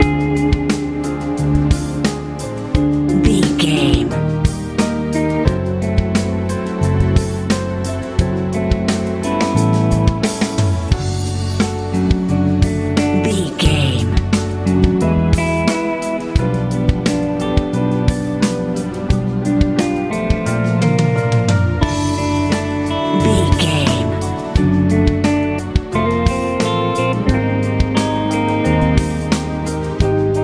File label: pop ballad